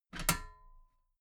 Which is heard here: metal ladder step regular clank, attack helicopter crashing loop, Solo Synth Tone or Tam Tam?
metal ladder step regular clank